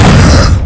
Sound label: fall; devastator